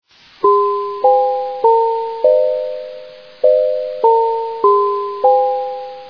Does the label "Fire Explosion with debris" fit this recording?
No